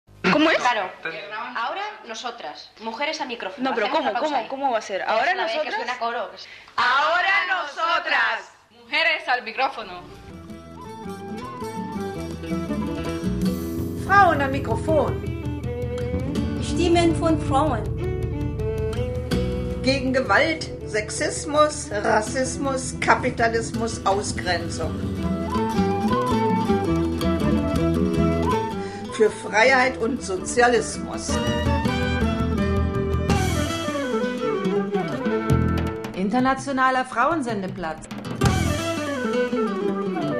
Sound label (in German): Jingle Internationale Frauensendung